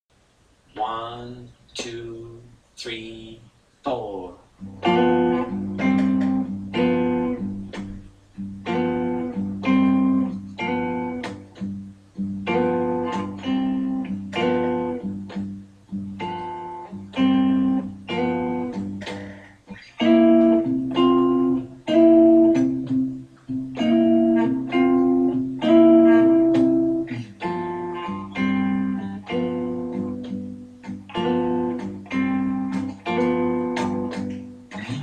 blues rhythm fingerstyle, guitar

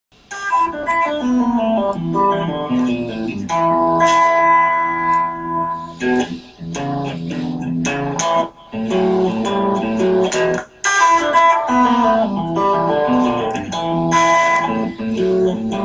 blues lesson e blues scale lick

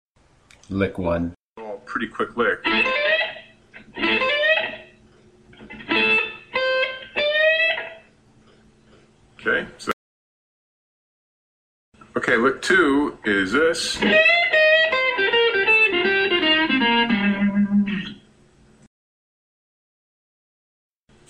opening licks, guitar

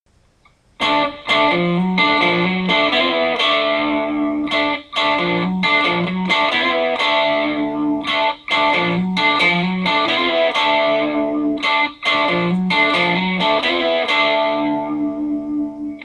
southern rock lick

